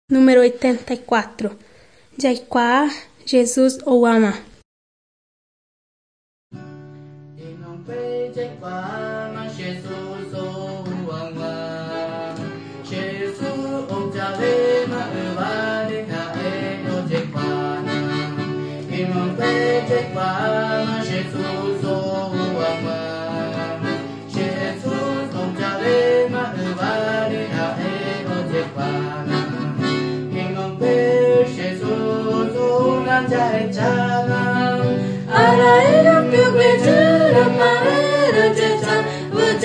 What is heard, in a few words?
songs guarani mbya